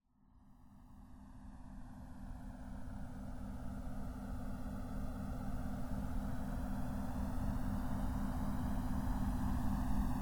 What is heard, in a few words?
Pink Noise & Binaural Beats Theta; Hypnosis; Hemi-Sync; Superlearning; World Music